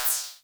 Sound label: effects, drum machines, FutureRetro FR777